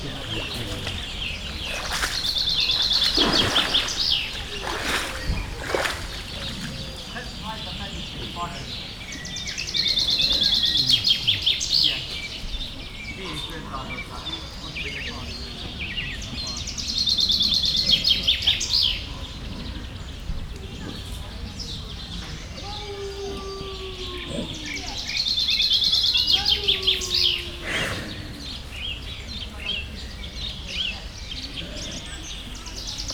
jobbra fürdőző medve balra holló, barnamedve; jászberényi zoo 2015

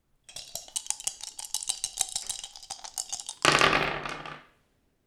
kockavetés, dobókocka; perkupa

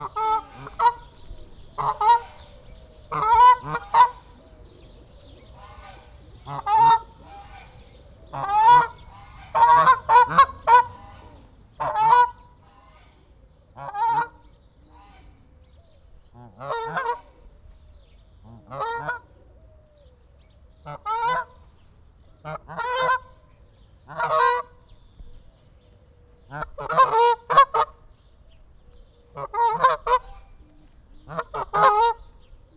visszafogottan, kanadai lúd; debreceni zoo 2012; marantz puska

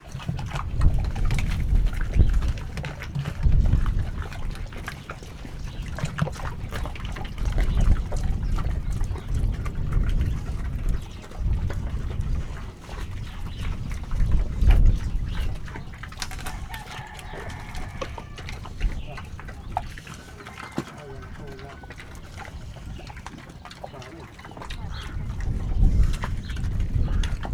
10 hetesek almát esznek szeles idő, mangalica; Hortobágyi Nemzeti Park

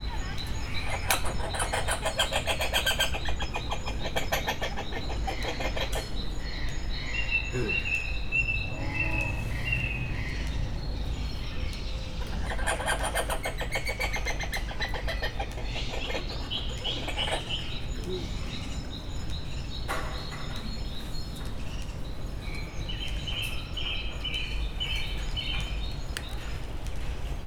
jobbról, fekete pókmajom; debreceni zoo 2012

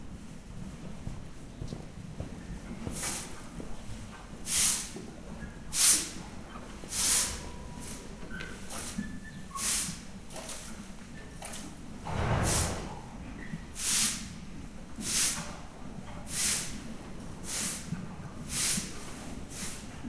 istálló seprése, ló; Hortobágyi Nemzeti Park 2013; máta

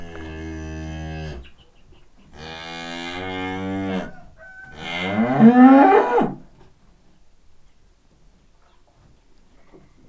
tehén bőgés kakas csirke, magyar tarka szarvasmarha; állathangok; paraszt udvar

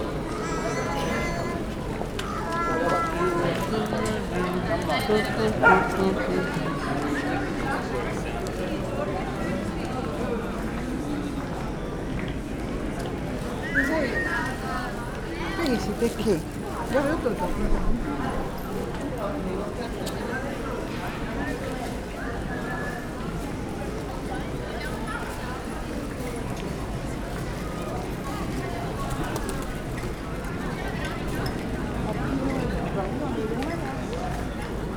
ketto molnarkocsi, utca; velence; olaszorszag 2014

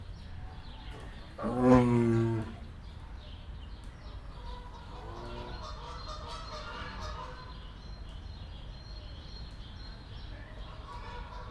csekély eredmény, szibériai tigris; debreceni zoo 2019

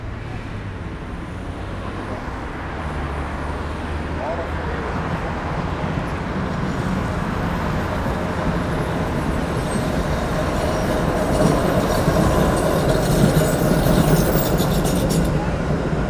14-es, lehel ter fele, villamos; beke ut

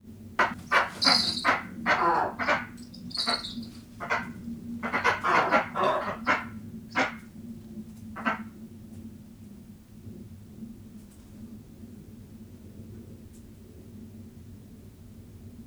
kerregnek, parlagi sas; allathangok; termeszetben; gores tanya

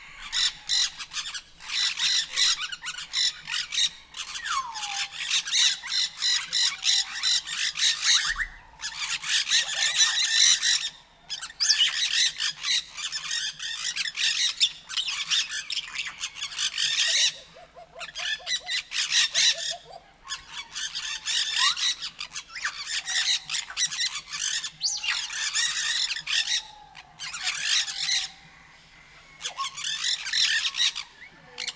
kolonia balrol jako, nandajpapagaj; nyiregyhazi zoo 2019

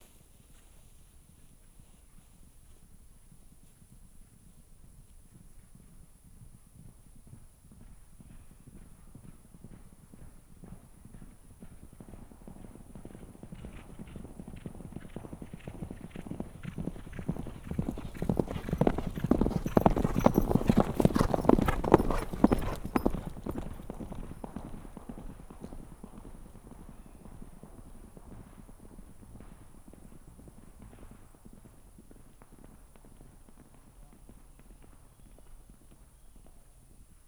vágta kemény földúton, ló; állathangok; paraszt udvar

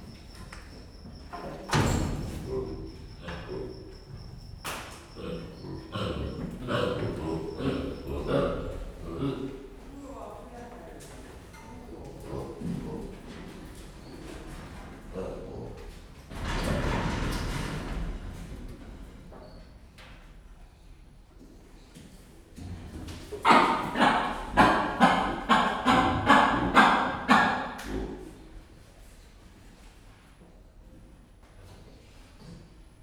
mozog a rács, gallérospávián; gyori zoo 2014

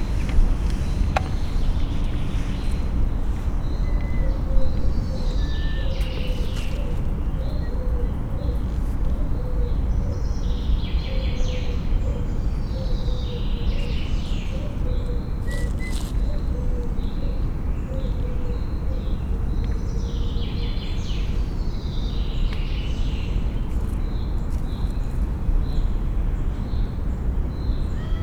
nagyon halk fütyülő hang kis emu; szegedi zoo 2011

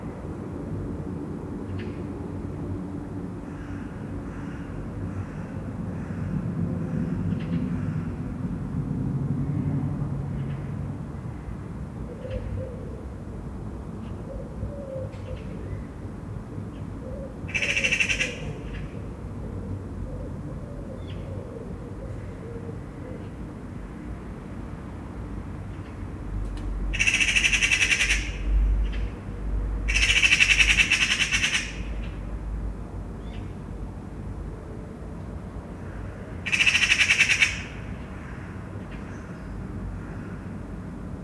közben galamb is megszólal, szarka; allathangok; termeszetben; angyalfold

